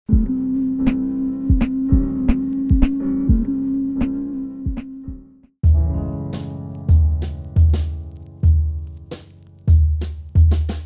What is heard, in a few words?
Beat